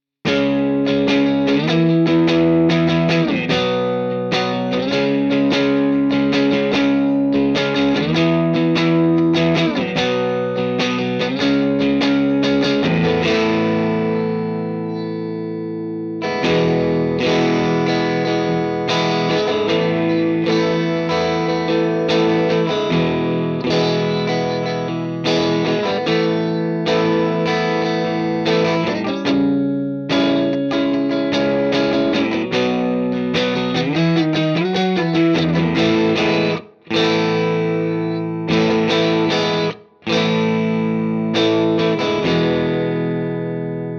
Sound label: Firebird Neck Middle Timmy OD Fender Deluxe, pickups; Revel